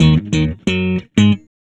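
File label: East Coast Hip Hop Low Guitar Eb minor; Digidesign; Pro Tools; Pro Tools Essential Loops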